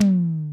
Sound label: Tom Mid, Drums; One Shots